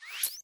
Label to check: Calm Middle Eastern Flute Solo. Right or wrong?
Wrong